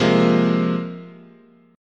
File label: C 7th Suspended 4th Sharp 5th, chord